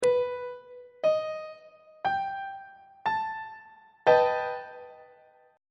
b augmented 7th chord; on treble clef

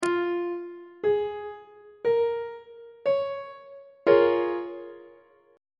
d flat major 6th 1st inversion on treble clef

